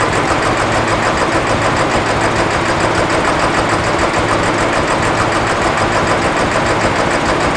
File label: ambience water pump